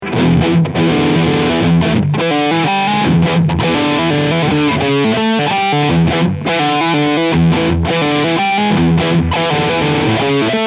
Ibanez RGA60AL ABL Overdrive Steg Pickup

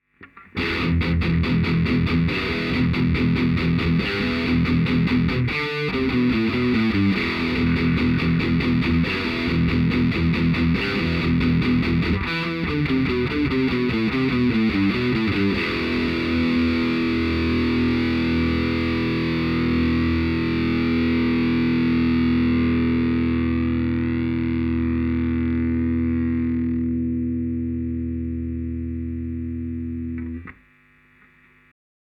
Beyerdynamic M130 E Gitarre 90°

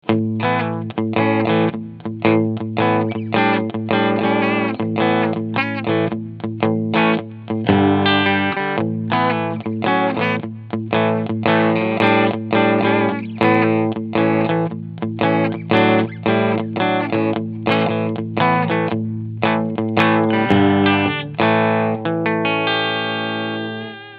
Squier Telecaster Cleansound Steg PU; 40th Anniversary

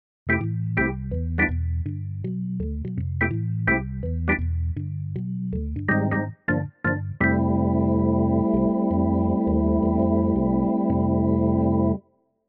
Roland RP102 Orgel

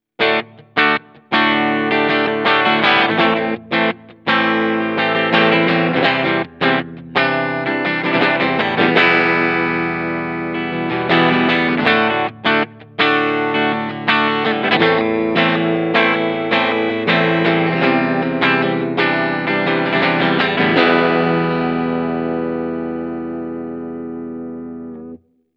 Schecter C 1 Exotic Steg Pickup Split Clean

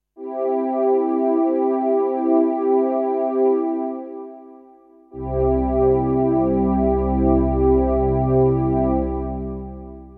J7 Ensemble Presets ohne und mit Chord Enhancer

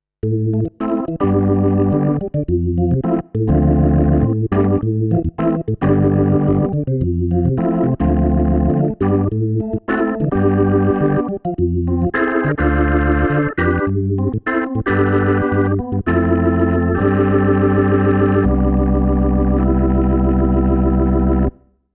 Orgel

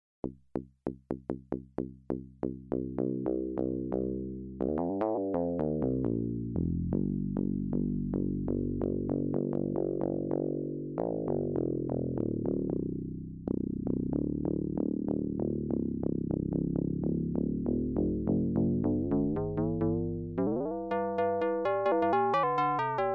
MicroFreak Soundbeispiel Modal Resonator

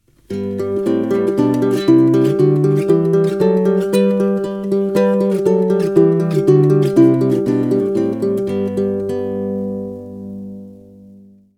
Klassik Sequenz

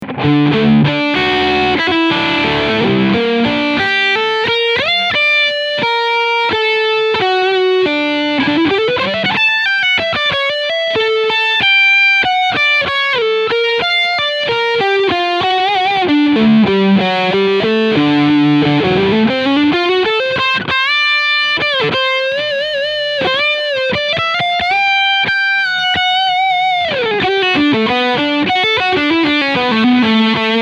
PRS S2 McCarty 594 Overdrive Steg Pickup

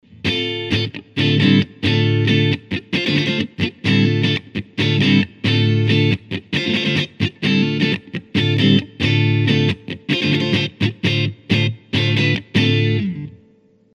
EQ Mitte Strat Hals PU; Yamaha

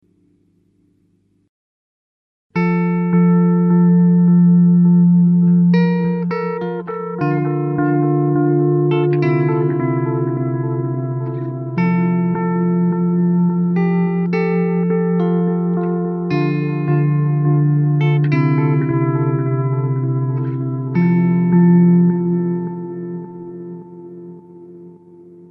Seymour Duncan Vapor Trail mit Vibrato

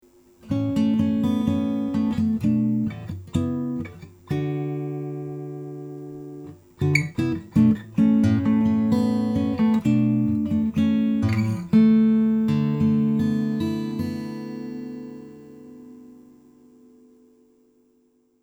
blue yeti gitarre acht

